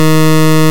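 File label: sounds beep beep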